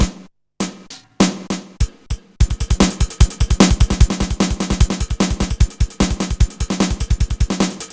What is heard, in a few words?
drums 4 fun; Crown; Trackers; Modland